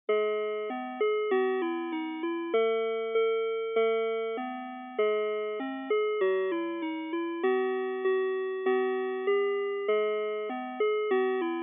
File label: Telephone Music On Hold; Salut dAmour